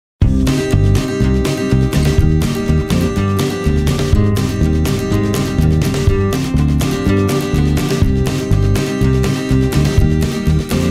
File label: western